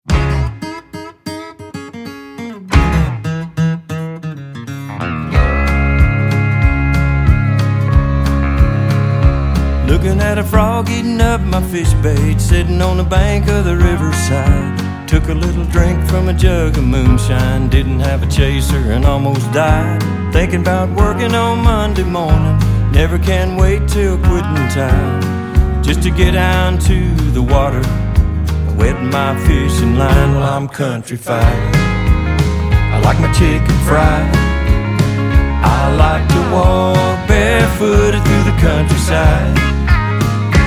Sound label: Countryfied